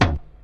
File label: old school reggae tom single hit d sharp key; tom drum